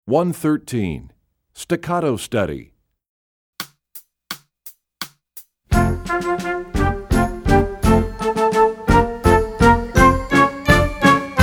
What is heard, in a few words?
Staccato Study; EE2000 Book2